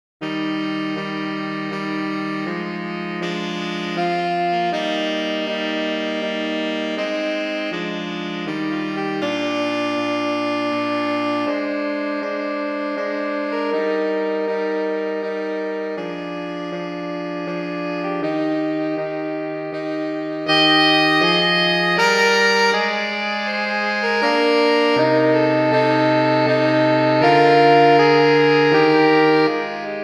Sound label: Largo Sax